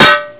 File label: metal clang